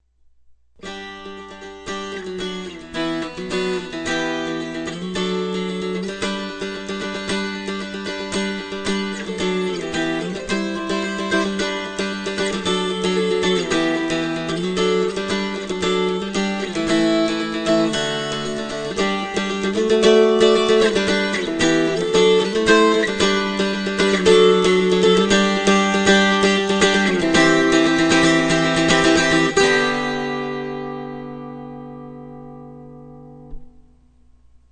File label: Doxology dulcimer; dulcimer hymnal